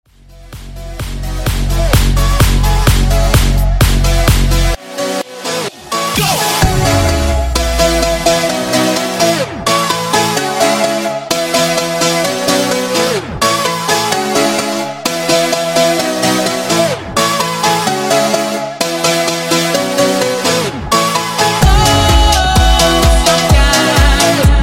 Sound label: Funk Bootleg 128 Bpm